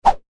cable whoosh air; Cable Swish / Whoosh As It Cuts Through Air - Good Karate Movement Or Transition Effect; Whips (Sound Effects:Sound Design); McKinney Sound

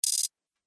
Electric Toggle Switch, button sound effects

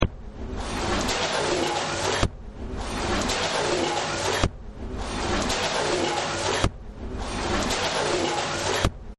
Factory; Factory 10

